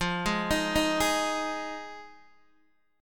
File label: F Minor 7th F Guitar DADGAD